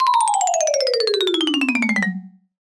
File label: comedy marimba descend; sound effects one